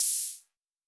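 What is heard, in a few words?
Hi-Hat Open, Luxor Passet; Hohner Automatic; Drums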